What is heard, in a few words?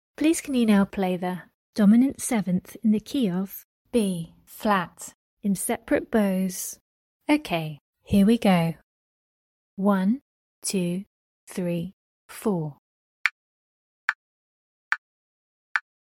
dom 7th key of b Flat sep bows